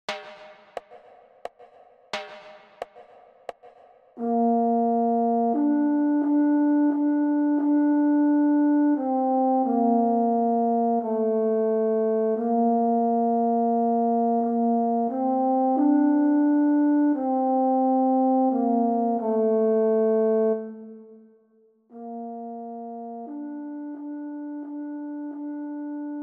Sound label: Pourtant horn; Ensemble Pourtant; si je brunette